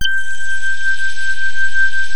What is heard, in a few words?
RAINMAN; NorthStar - The Wizard VOL-2